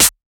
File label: Perc, Percussion; FLPRO